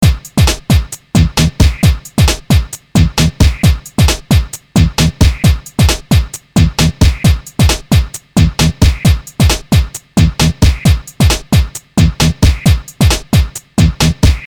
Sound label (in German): Loop unbearbeitet; EXS